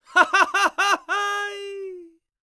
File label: LAUGH, ANDES; World Voices; ANDES FX; Spectrasonics Vocal Planet